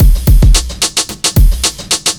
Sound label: block rock kit